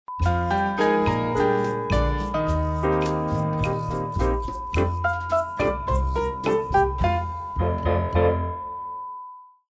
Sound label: groovy jingles